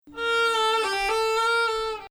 Bayati Nahawand, Maqam Bayati; Nah. 4; descent from 6--a major emphasis in this Samai, and in Bayati

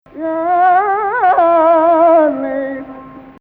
Rast Octave

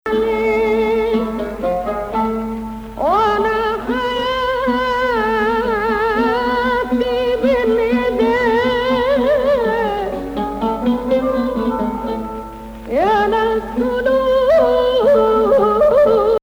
Nahawand7

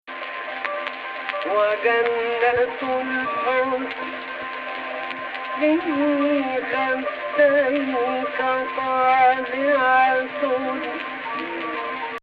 AHH, Egypt Rast

